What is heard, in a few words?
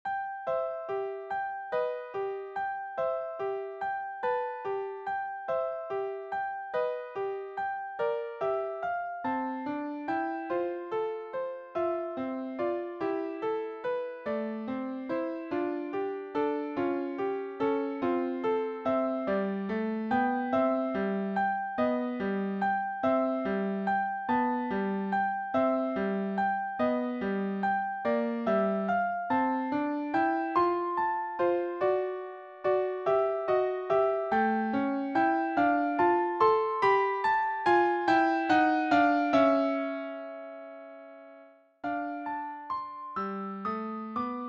Waltz Toy